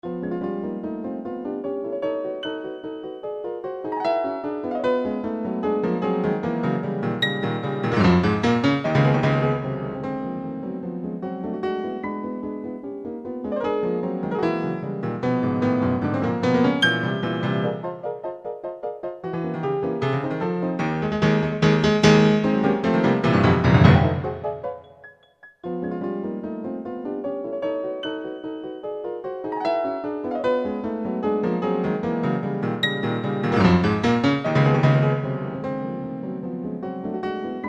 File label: Piano